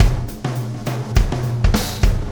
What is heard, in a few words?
Wormhole Beat, Processed Drumkits